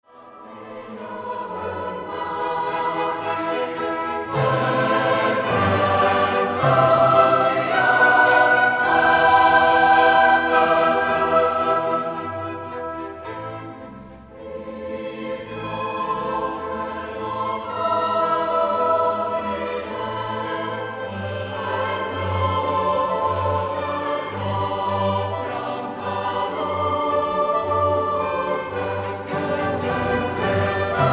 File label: coollegium vocale live; coollegium vocale olomouc; klasicka hudba